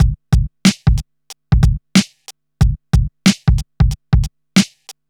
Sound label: 92 bpm drum groove g key; drum loop